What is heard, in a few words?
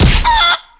hit chicken; animal; Tony Hawk's Project 8; XBOX